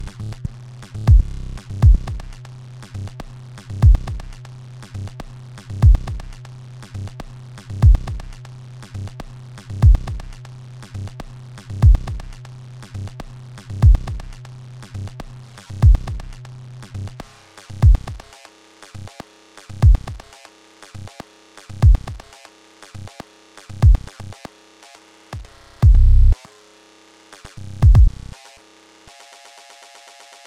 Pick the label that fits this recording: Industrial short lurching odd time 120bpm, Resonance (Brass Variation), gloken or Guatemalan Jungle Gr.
Industrial short lurching odd time 120bpm